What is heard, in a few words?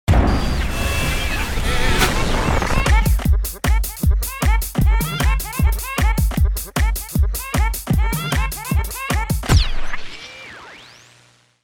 Dial It In Groove; DJ sounds; Mtrax